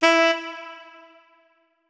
notes, keyboard saxophone; Hideout Furniture

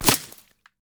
dirt, bullet collide; JSRS Sound Mod

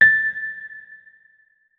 notes, keyboard, electric piano

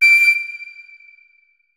flute notes; Hideout Furniture

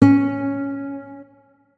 notes, guitar; Hideout Furniture

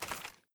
sr2m draw; Boomsticks and Sharpsticks; weapons

